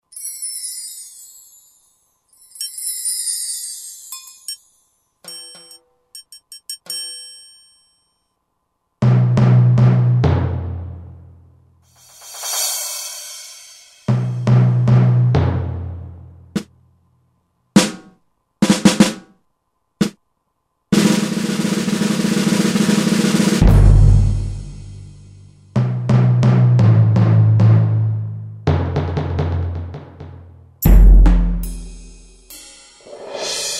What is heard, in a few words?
Orch Set